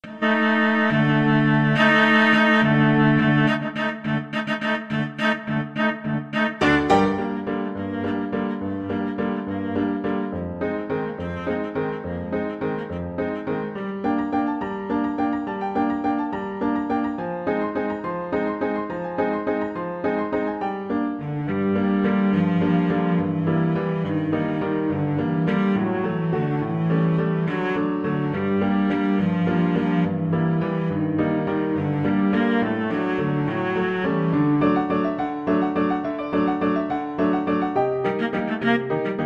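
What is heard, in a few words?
Danse Macabre, cello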